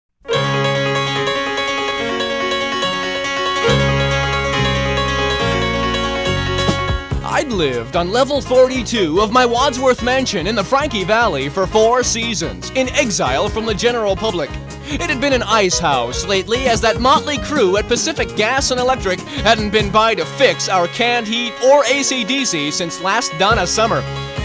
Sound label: Rock Rap